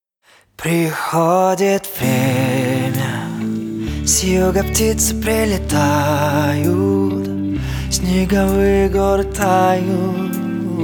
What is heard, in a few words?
tango